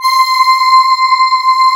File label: CMI ORGAN; Wizoo - Powered DX